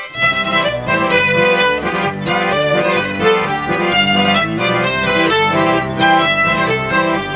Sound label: polka